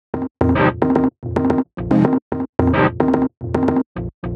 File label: 110 Fm Flinty, Melodic Loops; Electronisounds